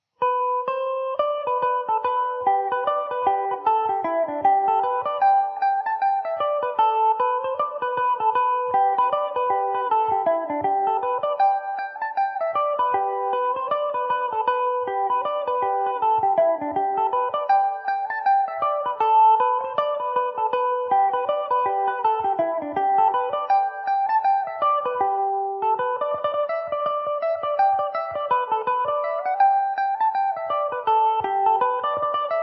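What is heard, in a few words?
G major revelation, irish mandolin; Hughie Travers’ No. 1; Revelation Jaguar electric mandolin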